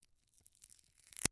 Tape Scotch Tape Pull Hand From Sticky Side, Household